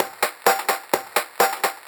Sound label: Straight Loop 128 BPM, House